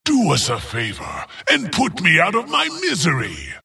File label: death long; dota; skeleton king